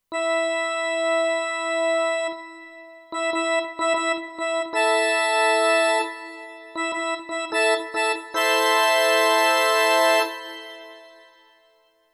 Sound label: Church Organ, Kurzweil K2500X; Factory Programs